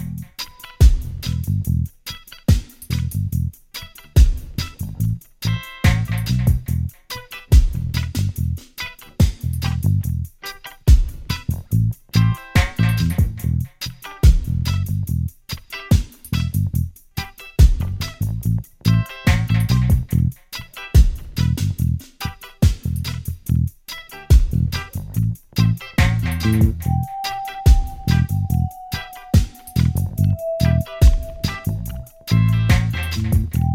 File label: Dub solo loop